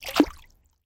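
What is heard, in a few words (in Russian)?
вода бульк одиночный активный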